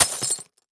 Impact Cinder Block Drop On Glass Debris Beer Bottles